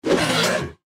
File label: deathclaw strike; npc